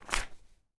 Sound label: Page Turn